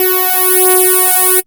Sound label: SWEEP F#3; Best Service Alpha Dance vol.2